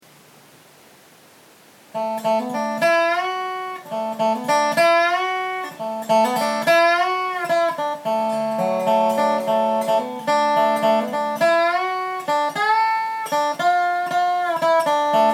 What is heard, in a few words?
steel guit; resohangout